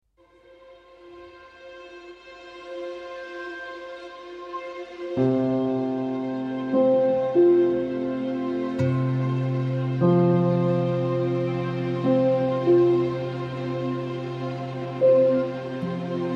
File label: AMBIENCE INTERVIEW